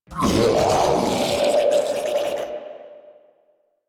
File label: Death; Grineer Ghoul Devourer